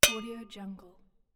resonating metal impact

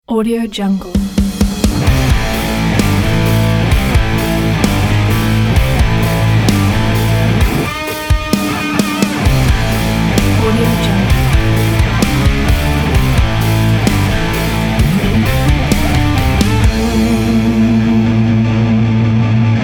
groove rock logo

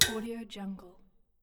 small metal hit

